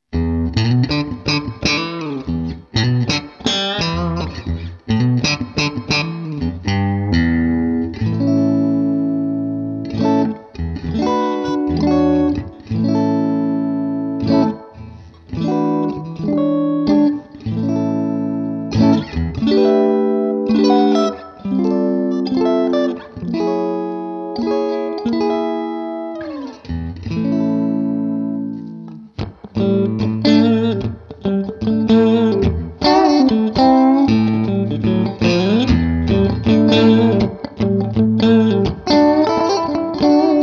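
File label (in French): Neck - Middle - Bridge en hors phase, micros, strat-like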